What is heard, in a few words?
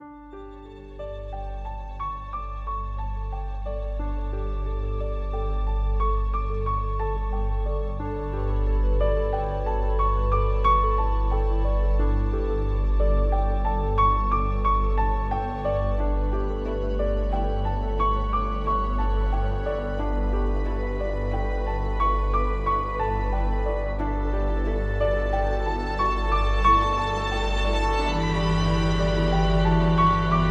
Beginnings Ambient